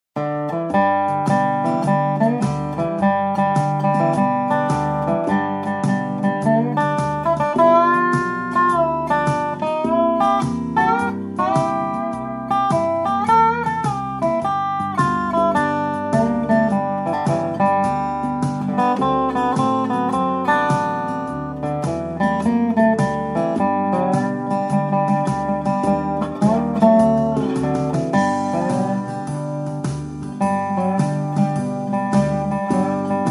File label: Blues